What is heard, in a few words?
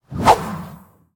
1H Sword Fine Underlay Attack